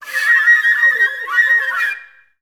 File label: FL FX, Orchestra; Best Service